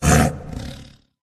tiger wound

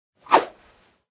cat dodge

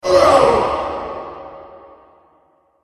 angel wound

